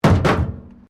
METAL BIN IMPACT PLASTIC CRASH BARRIER

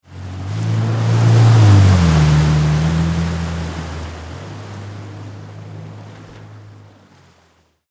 Jet Ski Away Slow, Watercraft; Transportation; Boats; BlastWave

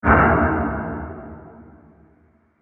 Haunted Door Slams Shut; Ghost Sound Effects; horror sound effect

